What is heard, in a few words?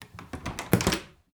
DOOR Wood Bedroom Open